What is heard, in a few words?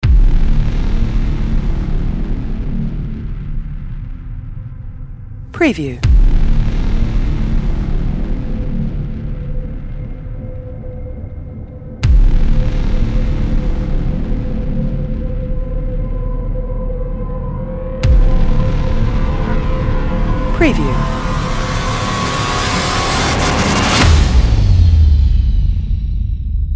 Cinematic Trailer Intro Braaams Horns Opener Countdown